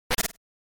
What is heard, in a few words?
Noise; GB General